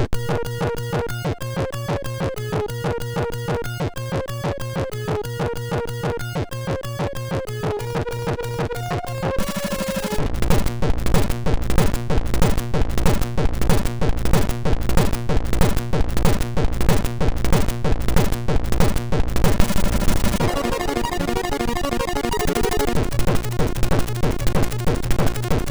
Rotation sid MOS6581; hvsc

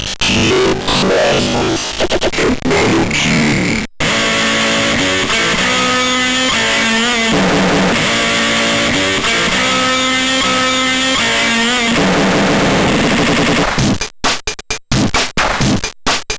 digitune, sid; Technolo-G; MOS6581R4; hvsc; Siebold Markus

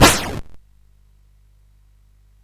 SFX; Narco Police

